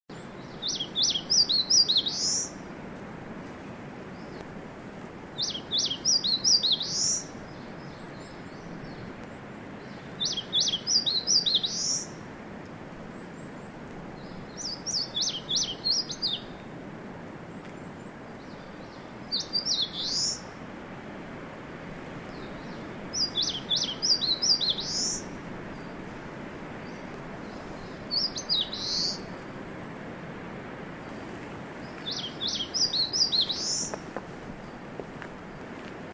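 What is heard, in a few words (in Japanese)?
センダイムシクイ, eastern crowned warbler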